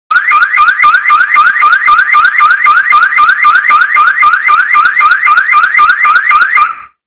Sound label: alarm